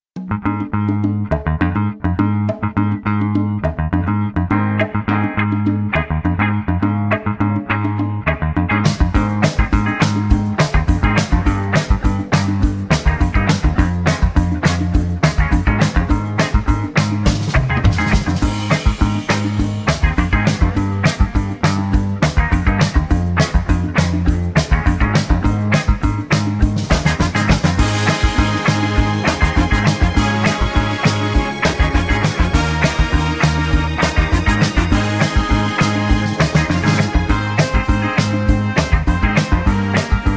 Ab No Backing Vocals, backing tracks

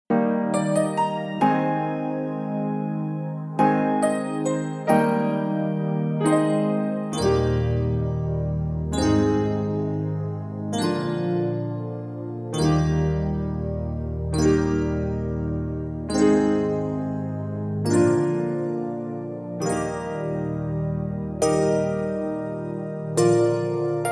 Eb No Backing Vocals, backing tracks

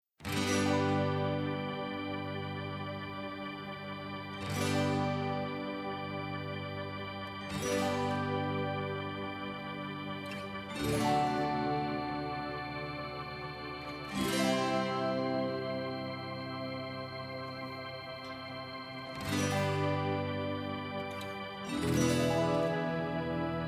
G To Ab No Backing Vocals, backing tracks

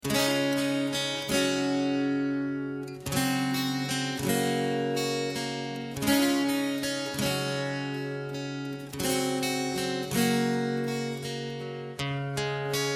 (Db) No Backing Vocals

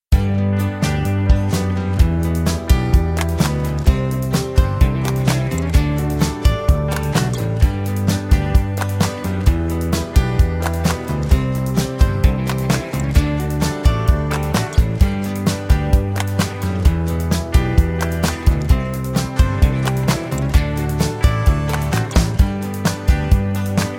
No Backing Vocals, (G)

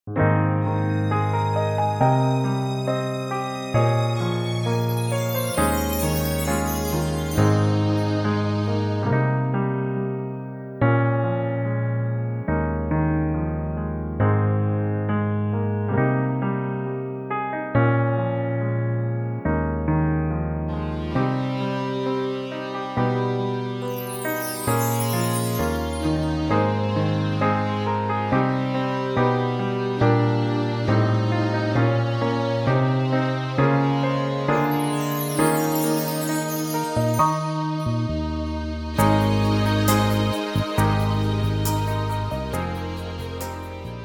(Ab To Bb) No Backing Vocals

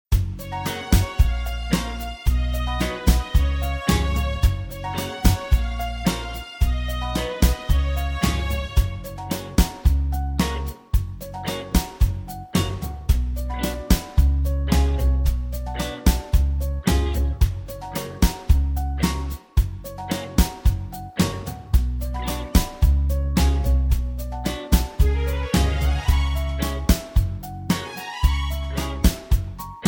Gb No Backing Vocals, backing tracks

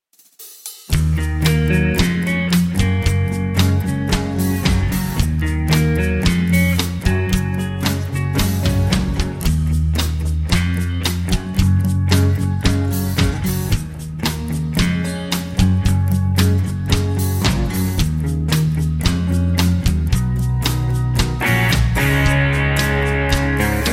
(Db) No Backing Vocals

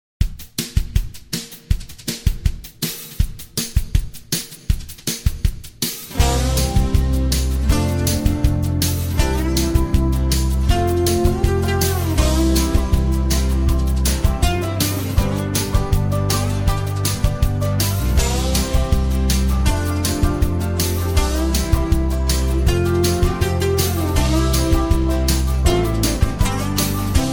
C No Backing Vocals, backing tracks

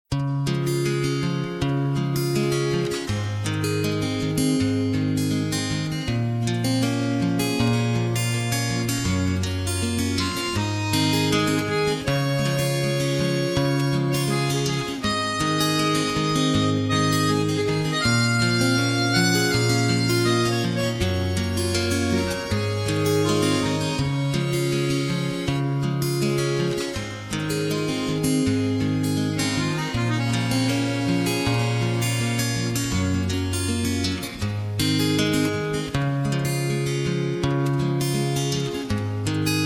Db No Backing Vocals, backing tracks

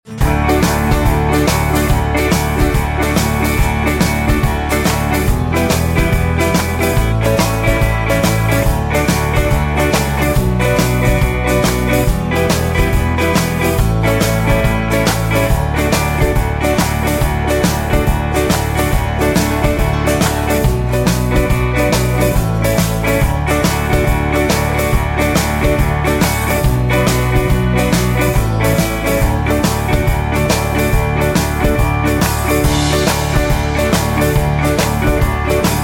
(D) No Backing Vocals

